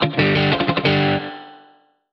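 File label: Jingle Round Result; clubhouse games 51 worldwide classics 2020 switch gamerip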